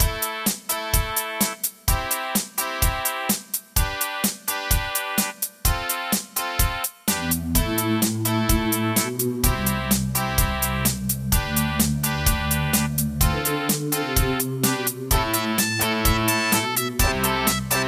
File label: Casio LD-80; raptor call of the shadows